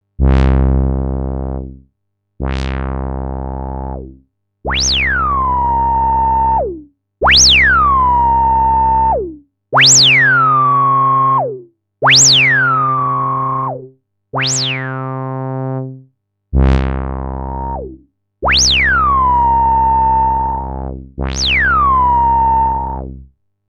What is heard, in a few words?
Pro One Trumpet Reso; SCI